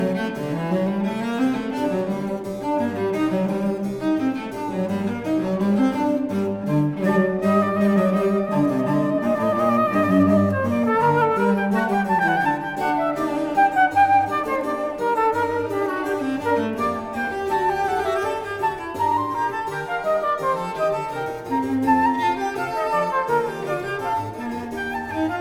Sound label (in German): Sonate g-moll für Flöte Violoncello und B. c.; 1 Satz